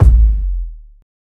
rich low end bass drum single shot a key; kick

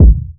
low kick drum sample a sharp key; kick drum samples

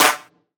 clap single shot b key; single hits one shots

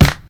clear snare drum D key; snare drum samples

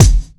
natural bass drum sample f sharp key; Bitdepth 24; kick drum samples

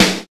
rich top end snare sound c sharp key; snare drum samples

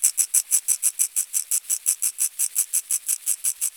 maracas studio shaker loop; percussion; loops

